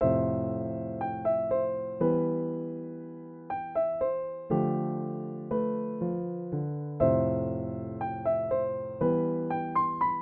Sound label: Piano Music